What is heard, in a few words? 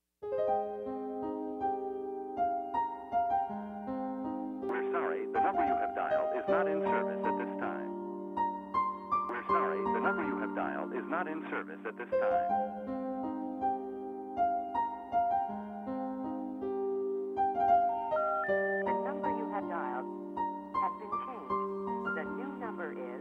bgm piano